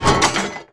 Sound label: cutelry drop floor